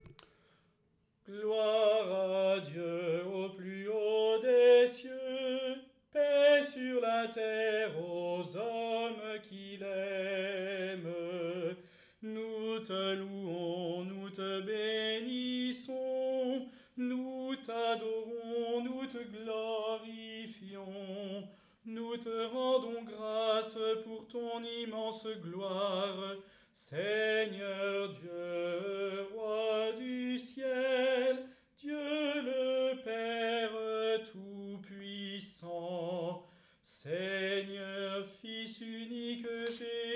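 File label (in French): Gloria Assemblée soprano; Alabau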